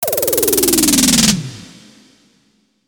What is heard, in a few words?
status down; 【効果音】ステータスダウン魔法＃４; RPGなどの魔法でステータスがダウンする効果音素材です。コミカル系。